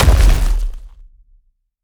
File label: Hand Hit; helltaker